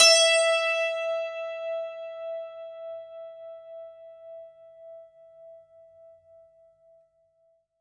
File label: santoor, e4